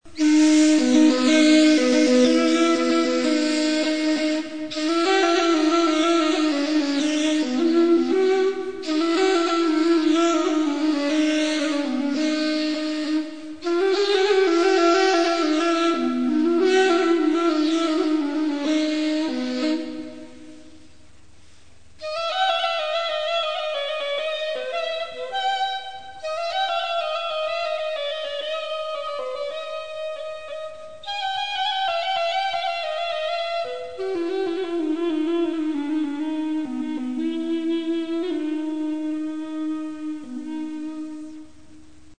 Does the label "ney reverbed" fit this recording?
Yes